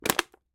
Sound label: plastic bottle movement; sonniss gdc